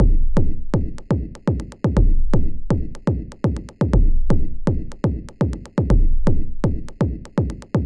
percussion synth massive 2 a sharp, clicky and clear; drum loops; kick loops